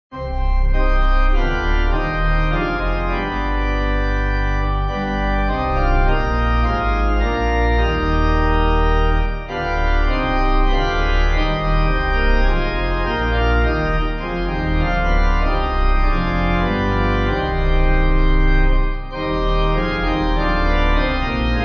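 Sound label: pipe organ hymn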